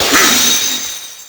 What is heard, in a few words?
cup break